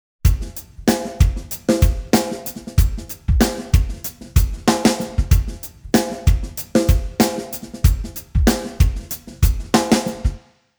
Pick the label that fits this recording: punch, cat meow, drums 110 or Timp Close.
drums 110